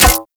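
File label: Full Draw Mech, Weapons; Infested; Cernos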